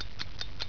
tick hi; ALARMS

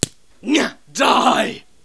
PENCIL

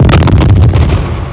CANNON

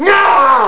NYAAH; PEOPLE

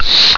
AIRPUMP

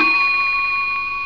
bell hi; ALARMS

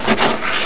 SLICER, MACHINES

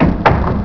DOOR OPEN

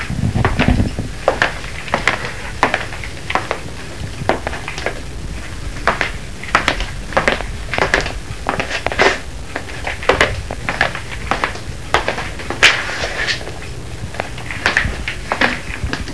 FOOTSTEP

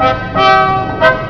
FANFARE

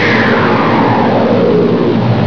JET RETRO; TRANSPOR

